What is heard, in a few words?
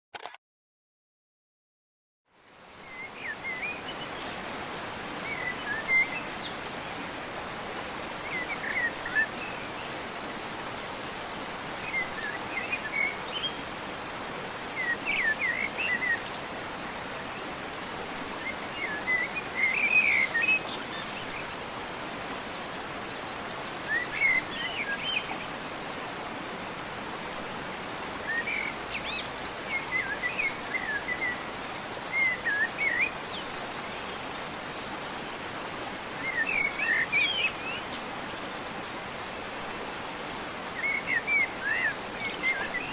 Nature Sounds Relax Birds Singing Vogelgesang le chant des oiseaux Bird Song